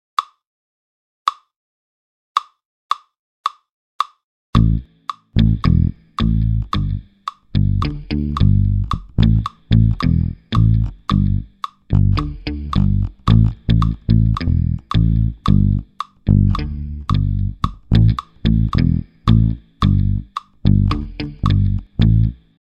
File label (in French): GUITARE BASSE, Batterie Reggae; Buchmann; Batterie Reggae VIbrations